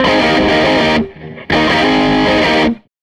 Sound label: Tele Guitar Loop